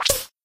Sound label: reload